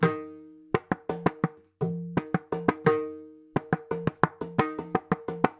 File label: Mridangam Roll Phrase